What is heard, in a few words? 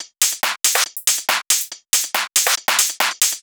Loop 140 BPM; vengeance essential club sounds-3